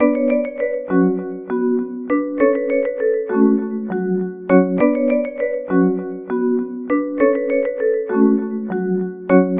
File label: Bass Vibe; Sonic Foundry (Sony Creative Software); Club Joints and Street Anthems